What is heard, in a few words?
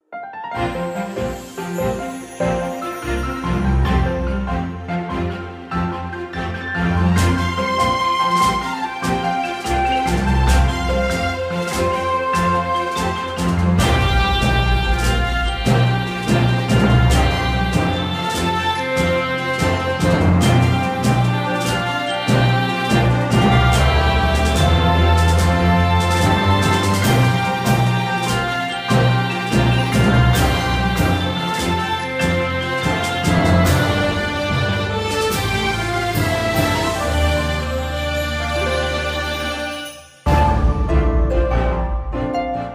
Battle BGM